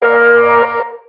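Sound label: buzz warn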